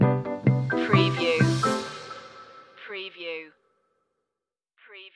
stinger

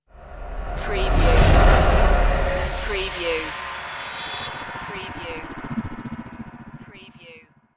idents

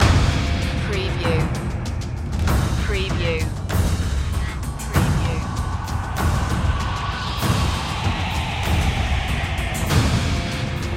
percussion loop

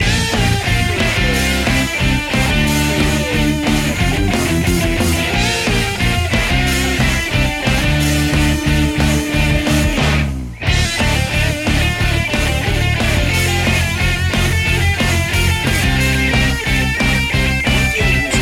driving force loop

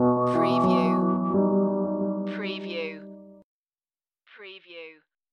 Hip Hop Dark Rhodes Licks 90 BPM Straight - B

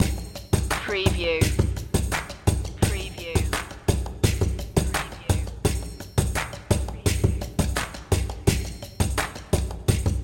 hip hop bach prelude loop

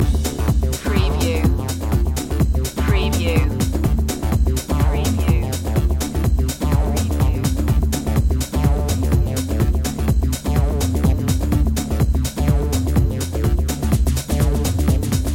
pulse line loop

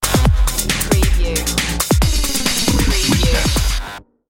start again sting

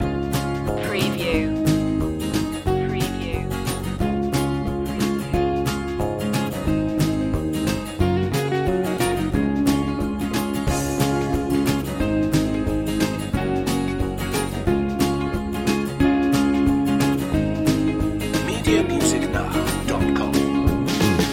back home loop

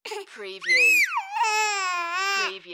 Baby Cry Scream; sound effects bible

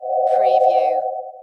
Amb oldtime

